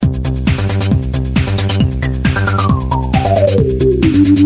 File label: techno space